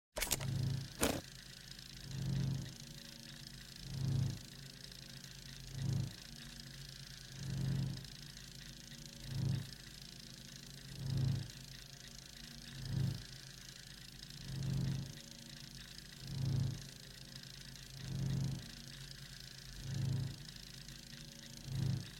Printer; Astroneer

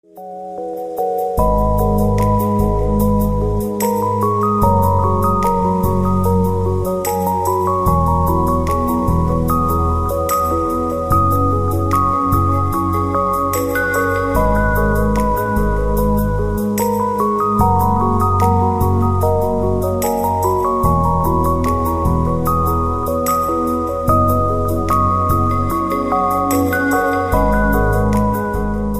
space organ

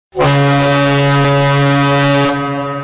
Boat Horn

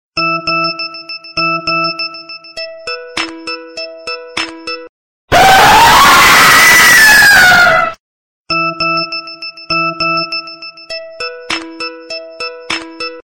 Scary Sms

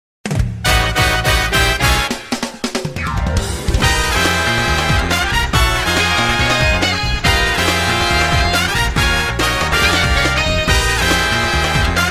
Spicy Brass Squad

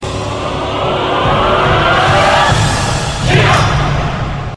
Ahh; Kira

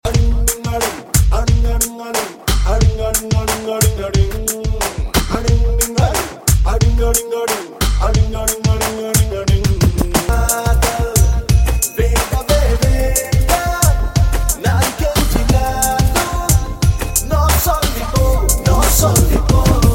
Dinga Ding

